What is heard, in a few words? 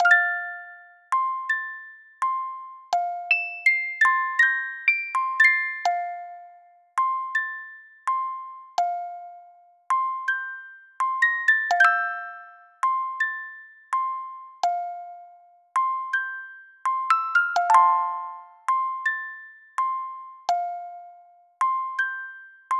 Island (Music Box)